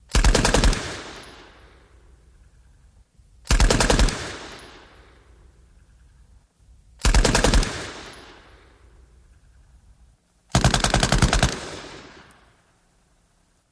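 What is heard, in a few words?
Machine Gun Cock Shot; bursts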